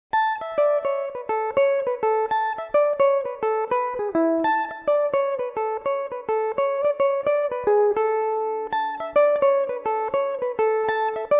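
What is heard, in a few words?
A major, Irish mandolin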